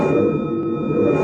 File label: force healing